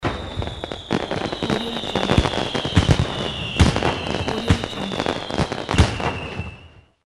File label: firework; audio-therapy